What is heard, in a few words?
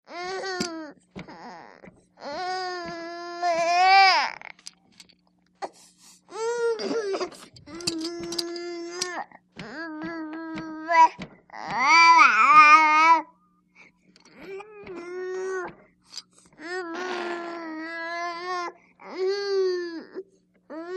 BABY SEVEN MONTH OLD GIRL: INT: I; Irritated, kicking playpen